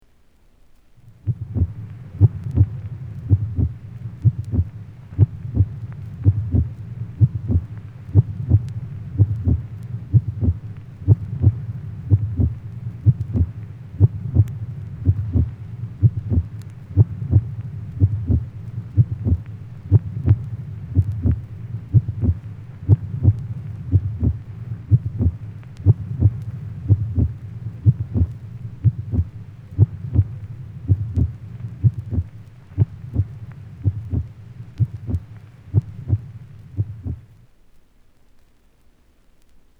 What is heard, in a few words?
Heart Beating; Halloween; Haunted House